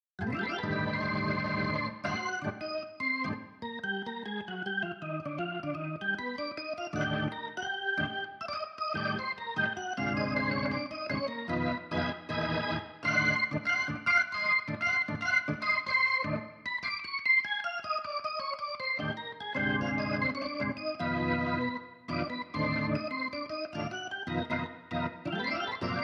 Organ

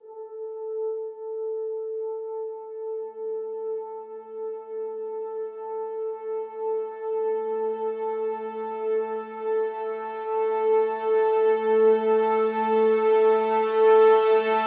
Brass Swell Hi (A)

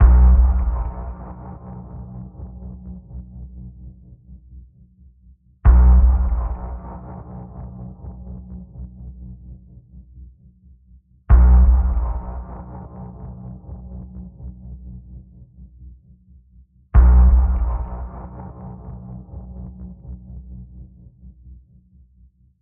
Danger Pulse, Key of B

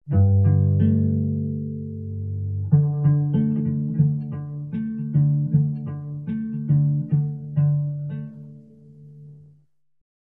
Contemplative Cello

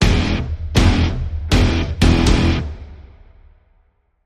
Stab Guitar Phrase (E)

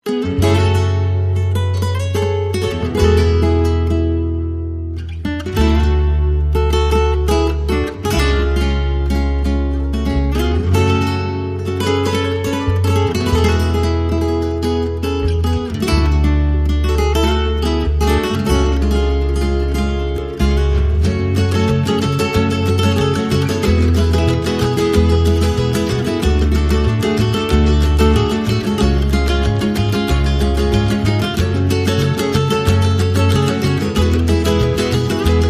No Percussion

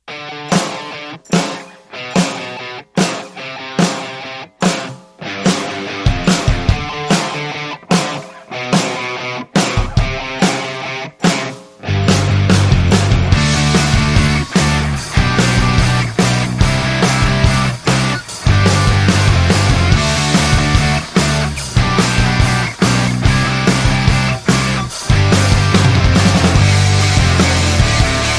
No Synth Lead

